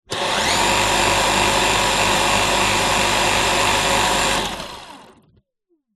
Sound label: household vacuum cleaner dyson v7 stick short burst close up rotating head